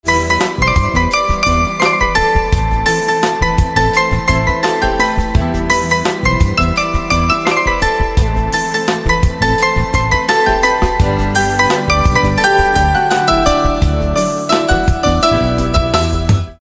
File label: Piano Instrumental Ringtone